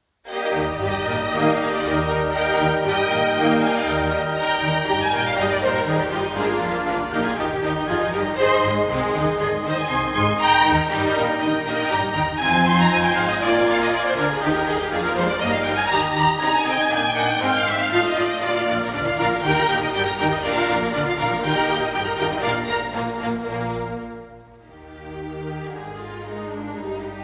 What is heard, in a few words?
Horn Concerto No. 4 in E flat; Mozart Horn Concertos